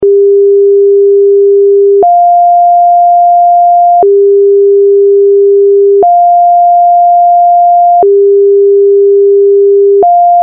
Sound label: Alternating 400-700; A Description of Sound and Its Qualities